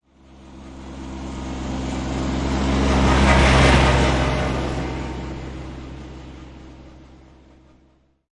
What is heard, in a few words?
Tractor Deisel Drive By Right To Left; Tractor: Diesel tractor drive by center. Tractor pass by; Tractor Sound Effects